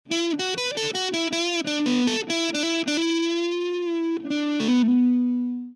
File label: WR120 Drive